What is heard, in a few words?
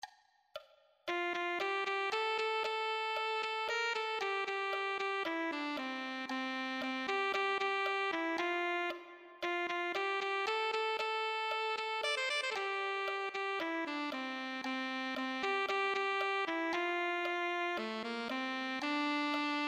alto saxophone